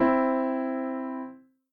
Major Third

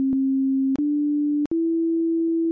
Piano Example

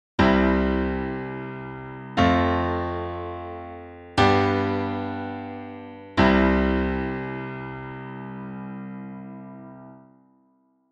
1 4 5 1 in C major; I-IV-V-I chord progression

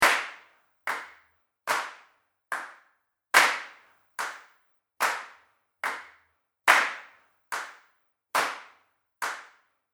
4 4 clap

